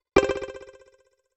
fin, message tones

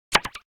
error; sound effects

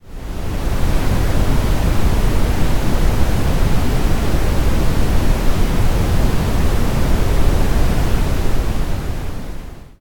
brown noise; 10 seconds

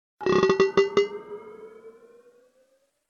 rising to the surface; message tones

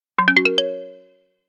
so proud

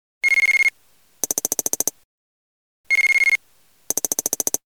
ending reached; wake-up-tones

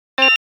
error

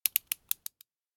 wind up, message tones

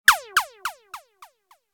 congratulations, message tones

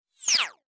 shoot em; message tones

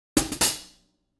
hasty ba dum tss; notification sounds

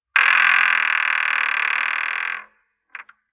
creaking door; everyday objects

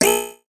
robot cant believe his eyes; wake-up tones

